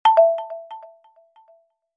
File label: Fountain, notifications; Huawei HarmonyOS 2.0